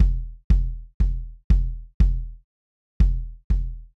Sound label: Pearl Kick; hydrogen